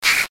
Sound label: bitcrushed bird